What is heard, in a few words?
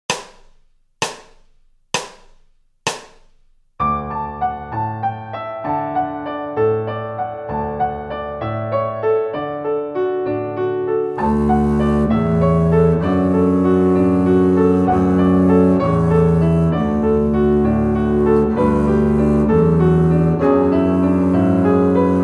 with Bass 65bpm